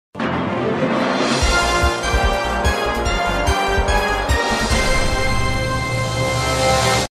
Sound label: win; milionerzy